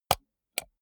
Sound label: computer mouse wheel click slow hard